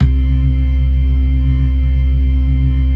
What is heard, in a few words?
LO MAN V L, ORGAN LOWER MANUAL